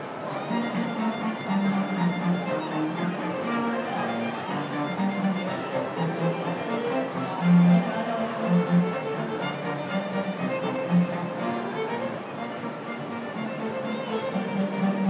the fairly awesome sound of a 4 string quartet in the trafford centre